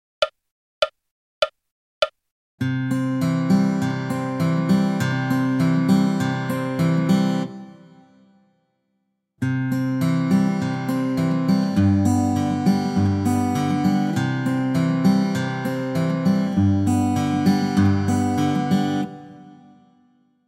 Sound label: fingerstyle